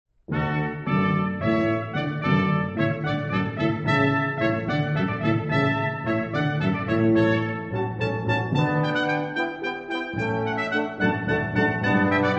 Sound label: hornpipe, trumpet